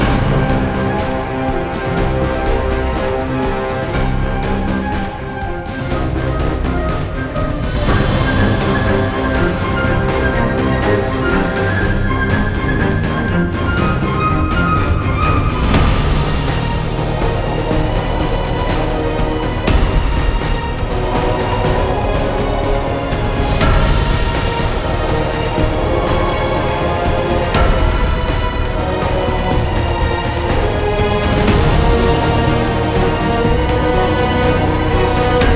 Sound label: Winter High Threat Loop; Fortnite